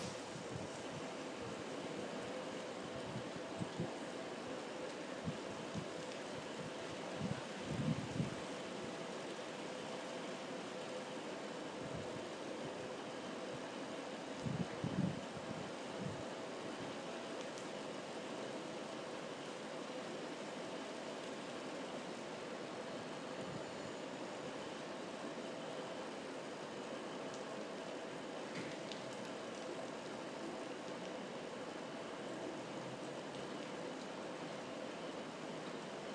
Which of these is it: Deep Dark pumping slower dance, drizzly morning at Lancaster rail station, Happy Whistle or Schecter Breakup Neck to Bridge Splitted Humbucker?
drizzly morning at Lancaster rail station